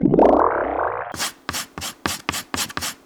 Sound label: Win Chalk Write; ItsComingHome